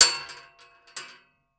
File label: Weapon Bullet Shell 7MM Dropping On Metal; AdobeCC